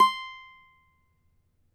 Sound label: CAVAQUINHO C, Guitars